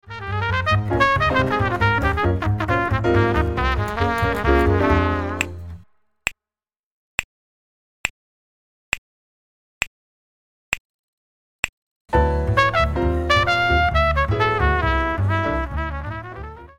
minus Drums snaps

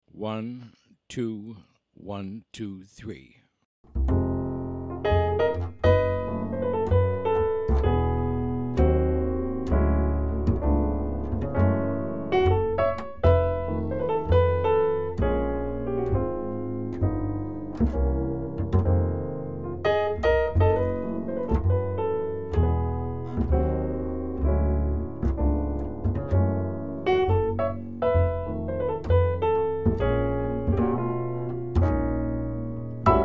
minus drums

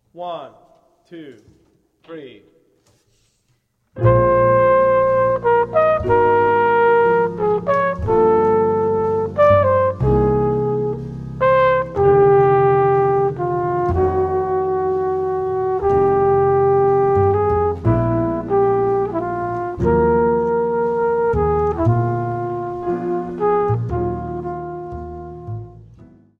minus drums

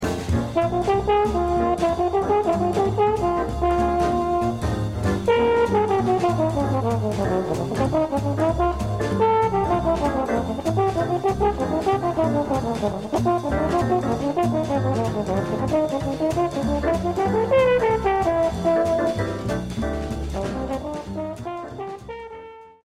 solo

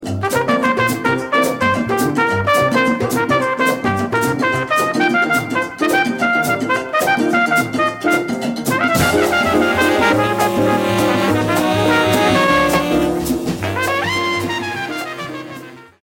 solo

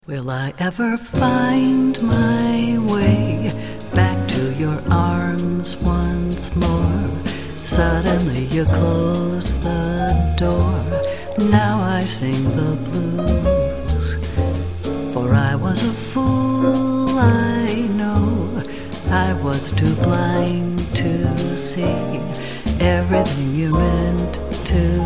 melodious funk

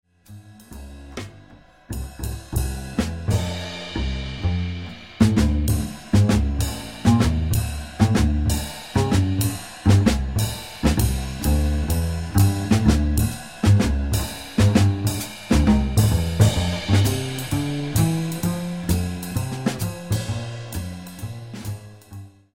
bass drums only solo

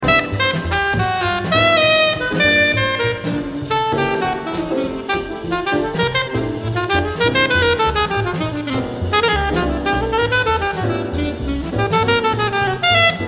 solo

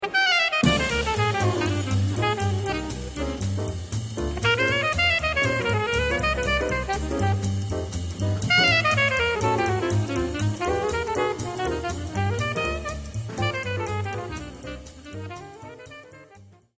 solo; alto

